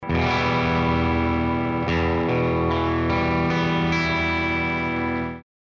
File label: Single Coil Bridge, stratocaster loaded pickguard